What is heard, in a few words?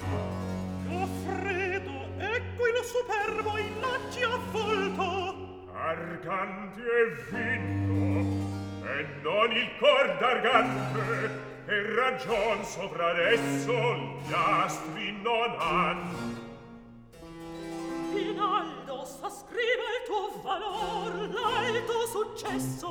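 Recitativo, Handel opera; Act 3; Goffredo, ecco il superbo; Rinaldo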